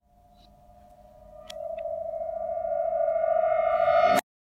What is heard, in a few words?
Piano sample backwards